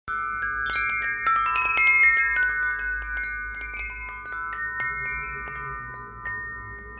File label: tube copper